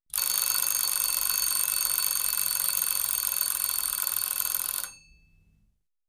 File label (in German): Weckerklingeln alt; Haushalt